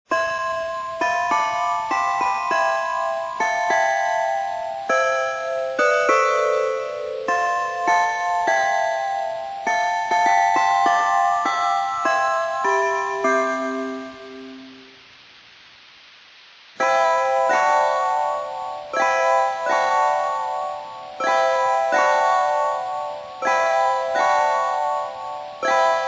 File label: 8bit